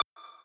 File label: lead d